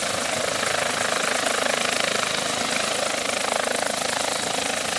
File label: heli; mcheli